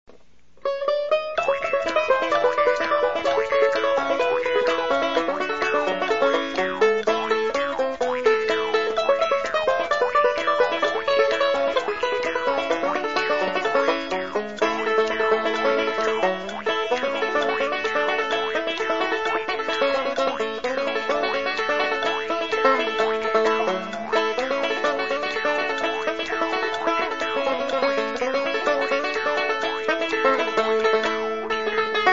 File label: banjo